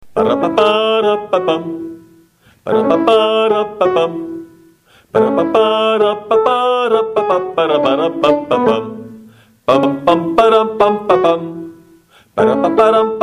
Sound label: candombe